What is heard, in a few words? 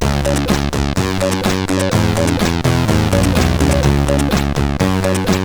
Short Tunes, CSG8580R5